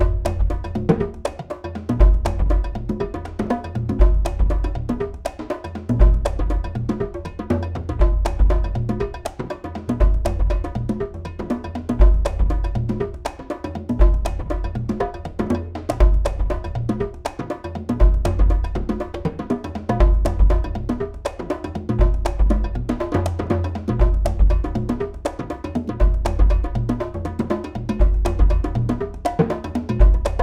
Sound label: Big Banging Groove, Drums